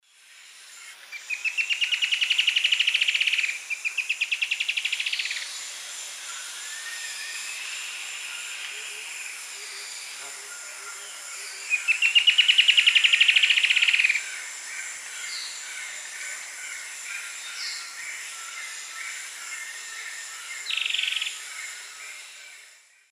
Myrmotherula brachyura, song and call; S. America; Eastern Lowlands

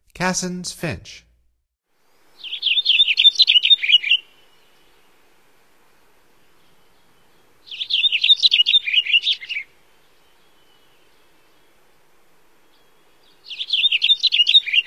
Cassins Finch, Songs of Birds of North America; Stokes

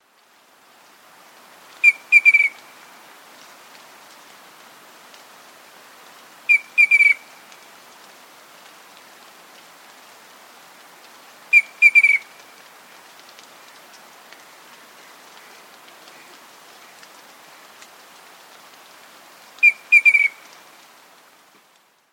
Rufous Antpitta; S. America

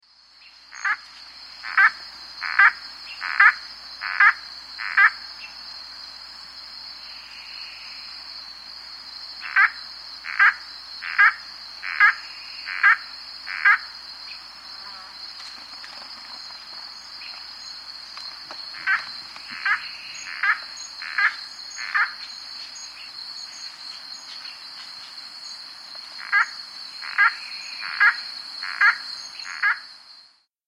Rio De Janeiro Antbird; S. America

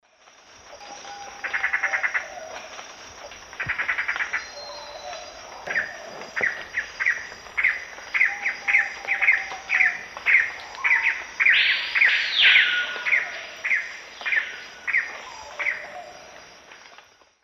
Cercomacra cinerascens, alarm call and subsong; S. America; Eastern Lowlands

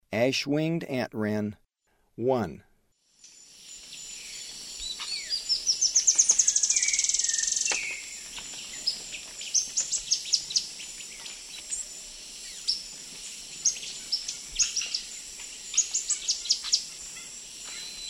Ash-winged Antwren; S. America; Eastern Lowlands

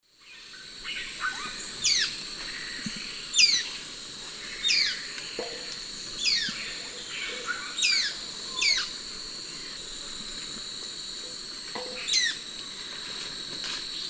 Mionectes oleagineus, calls; S. America; Eastern Lowlands

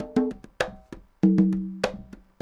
conga 100 bpm, latin; Sound Effect Library

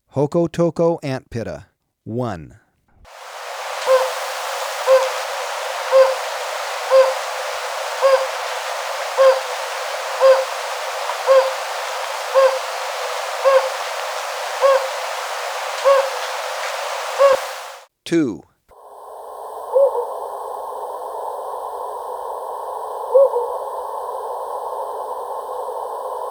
Jocotoco Antpitta, Birds of Ecuador; S. America

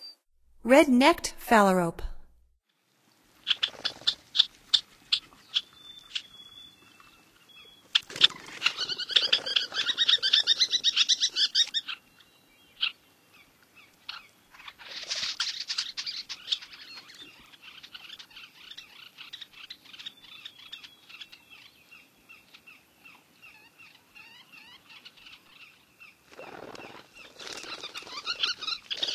Red-necked Phalarope; North America; Stokes

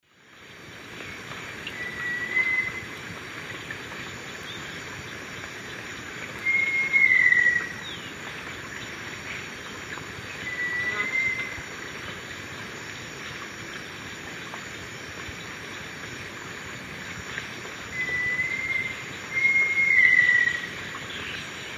Crypturellus soui, duet; S. America; Eastern Lowlands

